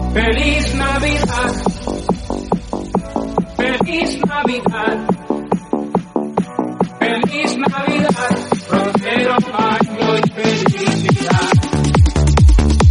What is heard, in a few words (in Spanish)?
navidad techno